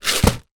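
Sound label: fuse beads in plastic tub down on surface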